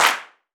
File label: Gospel Clap; Roland BK-5; Claps & Snaps